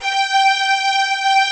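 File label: arcato string; OMI - Universe of Sounds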